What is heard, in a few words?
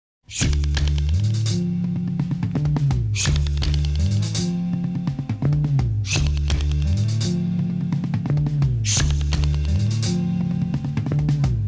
music